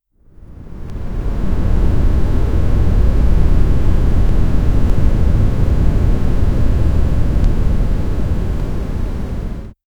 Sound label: quiet urban night ambienc